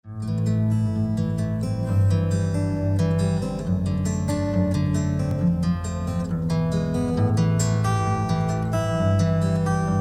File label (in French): Accord